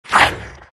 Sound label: big snarl; Undying